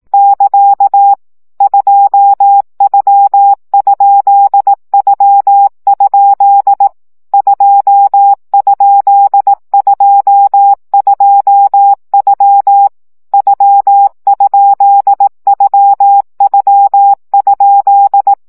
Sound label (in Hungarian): CW gyakorlas u2 2 kerdojel 15 baud